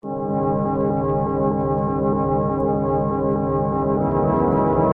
PULSE PAD

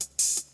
hihats

